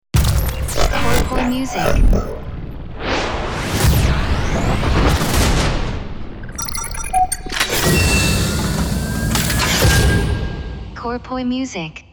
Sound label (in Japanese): jingle ウォーター